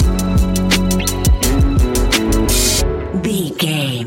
technologic robot dreams stinger, A relaxed and chilling piece of music